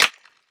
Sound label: Clap Over There; One Shots; Drums; Ableton Live 12 Lite; Core Library